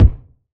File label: wet kick drum single shot b key; Duration 0:01